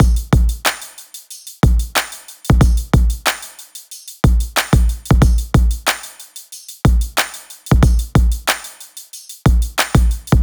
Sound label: drum loop 92, Pop; Drums; Full Drum Loops; Slate; SD PASADENA Pop Sample Pack